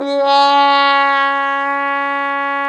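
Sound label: brass plunger trumpet, plunger mute; Plunger & Wa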